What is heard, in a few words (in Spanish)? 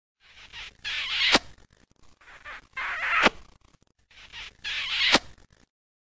un beso muack